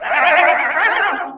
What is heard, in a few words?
Magic TRIPOUT